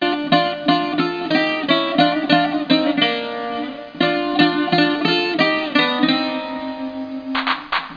lover beat; Finland

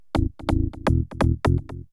SNTH BASS PROGR 125 A (R); eJay

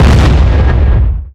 Tank Gun Heavy; 1944 Battle Of The Bulge

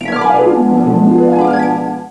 new spell; Ferazel's Wand Demo

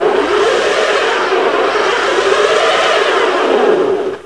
SPIN ICE; mtm2demo; PC Gamer 4.2

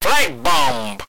flak bomb; jamxm

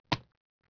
splats

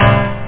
RIDE

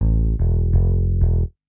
SNTH BASS, Bass; eJay

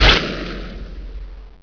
brn fire; h2siege; weapons

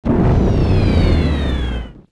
Close Program; BroodWar

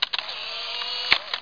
camera

